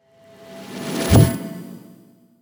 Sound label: Riser